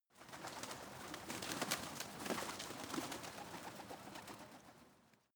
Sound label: pigeons flutter; natur-tiere; voegel